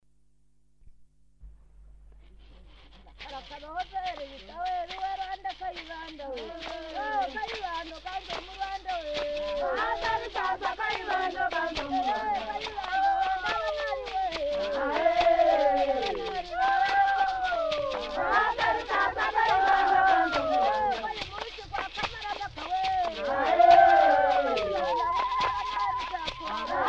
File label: ikinyuguri, rwanda